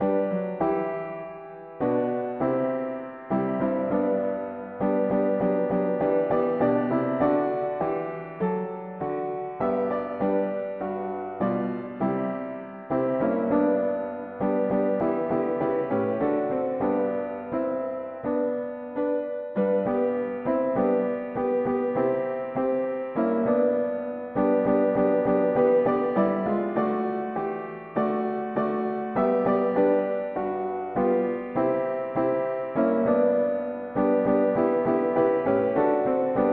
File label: mono piano; hymns